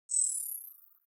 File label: ui classic zoom out light